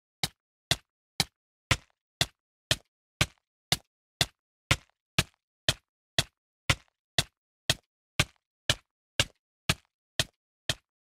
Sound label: Hurt, player damage; Minecraft